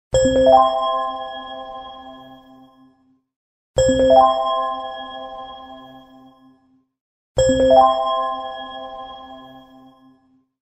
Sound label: Love alarm